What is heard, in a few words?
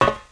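footsteps metal; Spearhead Demo; MacFormat 2003 June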